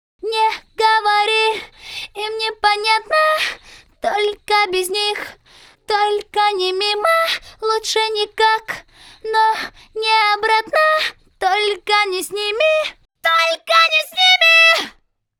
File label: VOX Couplet MIXED, acapellas